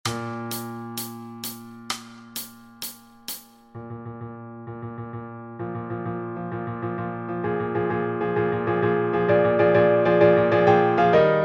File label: ramblin wreck satb choir midi track eb